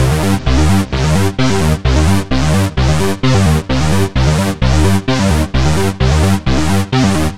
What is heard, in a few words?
130 Cm synth, Synth Loops, Trance; Trance Explosion Vol1